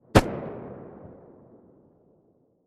glock17 silencer mono distant

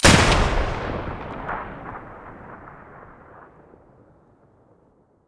muzzle beneli stereo, weapons; m1014

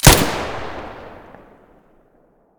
muzzle stereo, weapons

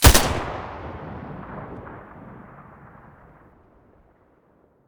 scar muzzle mono

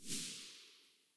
aug silencer mono very far, weapons; STALKER; IWP

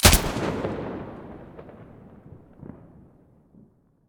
aug muzzle stereo, weapons; STALKER; IWP

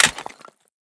pistol drop tile; STALKER; IWP

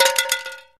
conserva; STALKER; IWP

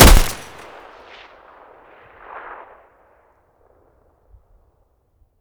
mg muzzle mono, weapons stationar

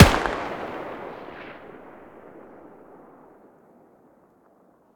mg muzzle mono far, weapons stationar

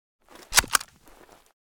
mosin reload end, weapons

